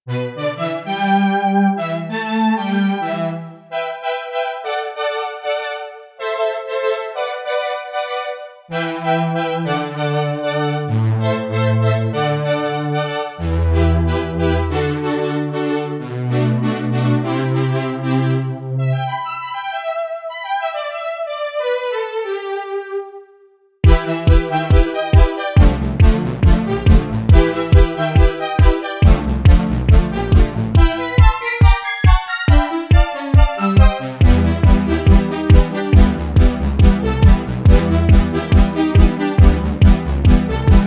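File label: Fast Analog Strings, Korg Radias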